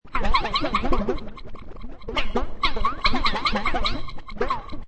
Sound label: Space Scifi Sound Weird Talk, Sci-fi, strange creatur; Sci-fi, strange creatures or critters. Cute space beings communicating.